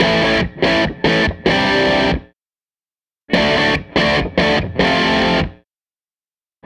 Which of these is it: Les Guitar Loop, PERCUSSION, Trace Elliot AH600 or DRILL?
Les Guitar Loop